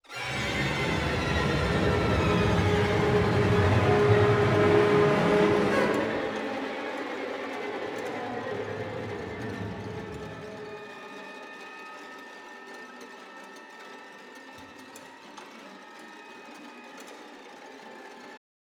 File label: Airlock music